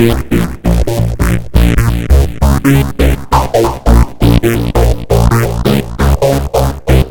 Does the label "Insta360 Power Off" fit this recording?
No